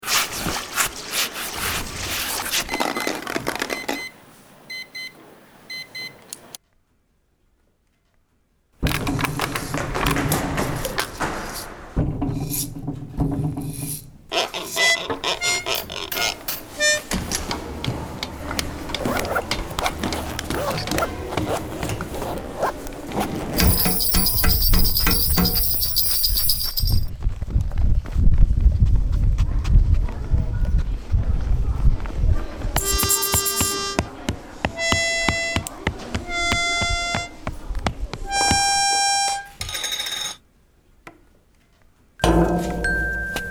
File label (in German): Geräusche aus dem Klassenzimmer